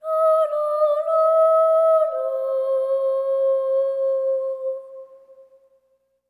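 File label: BOY phrase, Symphony of Voices; Spectrasonics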